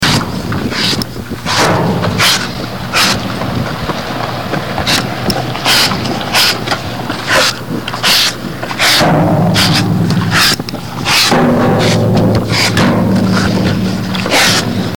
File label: lick, cow